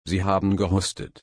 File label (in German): husten